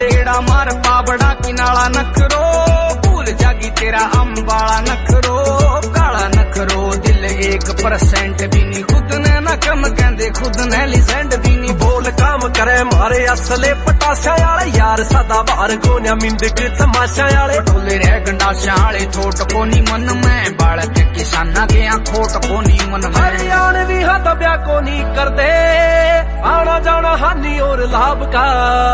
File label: Haryanvi Ringtone